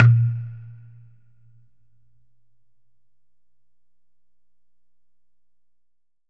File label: COCONUT WET; Q Up Arts; Denny Jaeger Private Collection